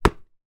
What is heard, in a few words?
sport rugby ball bounce hard ground